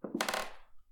steps creak; baileywiki maps premium towns

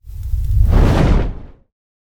cast fire side; casting

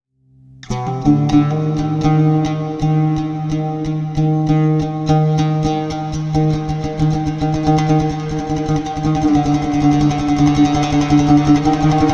middle eastern darkness fear; sonniss gdc

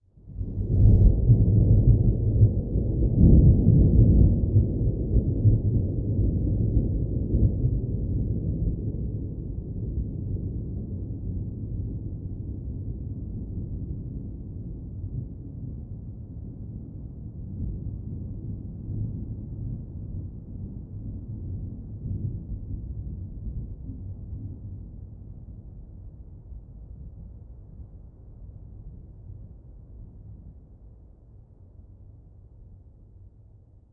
thunder rumble deep; fienup

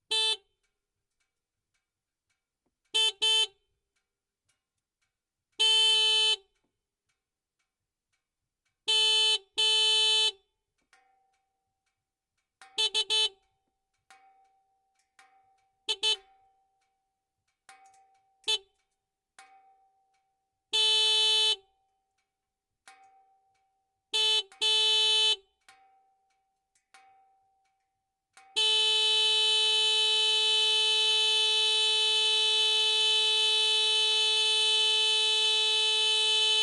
yamaha kodiak var horn engine; sonniss gdc

